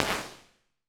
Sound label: Movie Theater, Impulse Responses; Small Spaces; Indoor Spaces; Apple